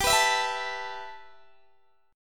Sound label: G 6th Add 9th G, Guitar DADGAD